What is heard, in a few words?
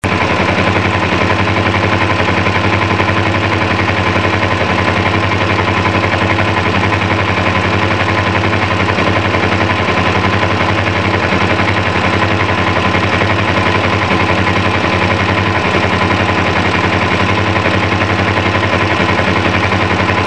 ZZR 00km